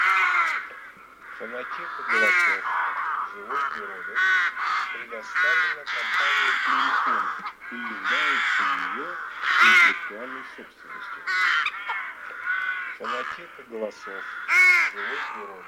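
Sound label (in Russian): Грач Corvo nero, манок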